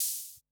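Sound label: Analog MT40 Open Hat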